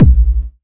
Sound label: Kick Electrified